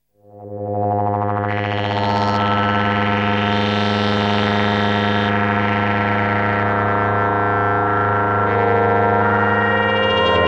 esq1

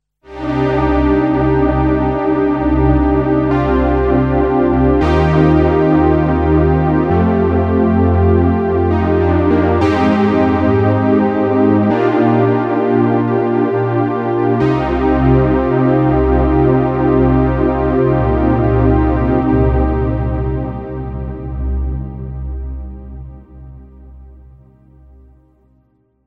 matrix1000

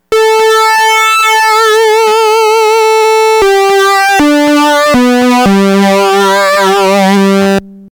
sync, synths; PEAVEY PARADOX

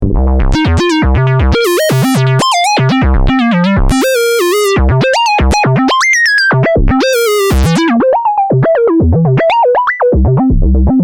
waldorf pulse

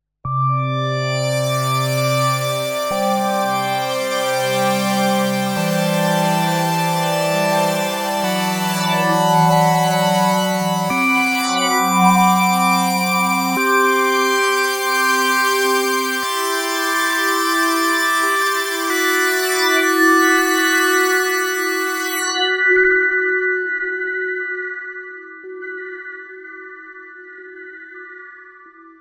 matrix1000

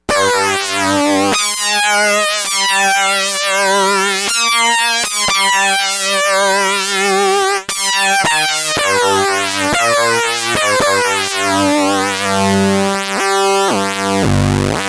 sync, synths; PEAVEY PARADOX

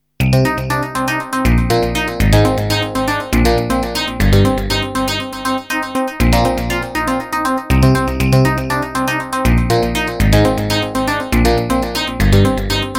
tx802 fairlight

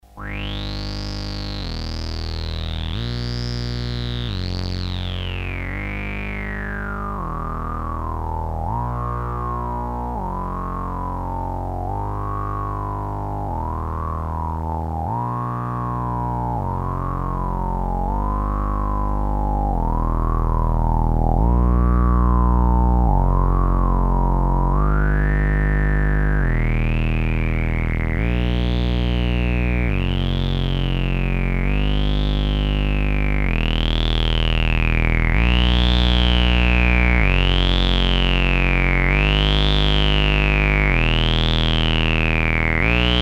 subbass, synths paia

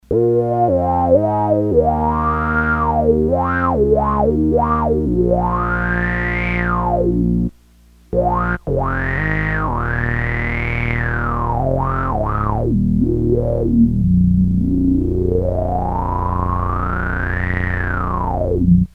mellow, synths paia

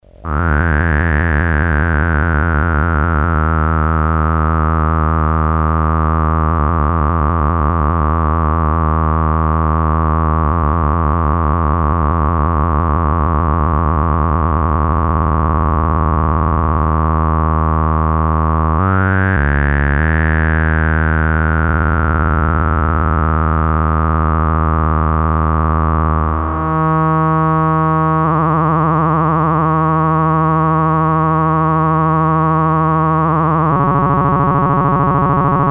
lfo, synths paia

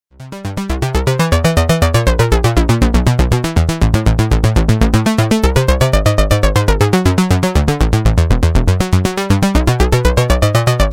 waldorf pulse

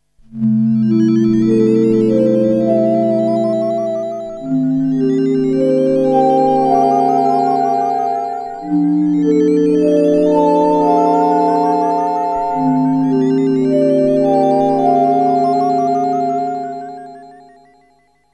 esq1

